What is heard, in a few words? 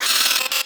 Vocal Pain; Hyena Micro